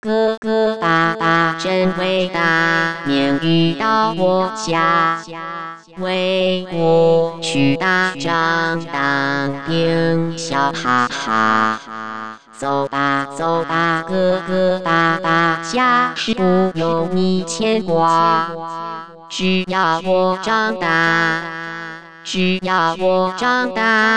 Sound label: song, syn song